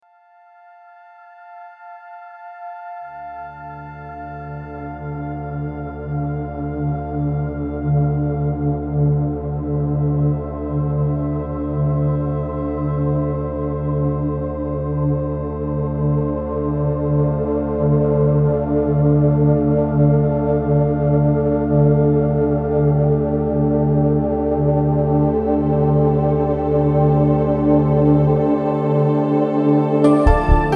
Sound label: Bikalam For Male